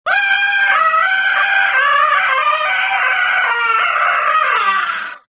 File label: falling, rodian